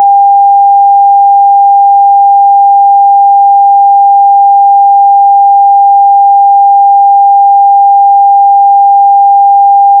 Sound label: clean electronic test bee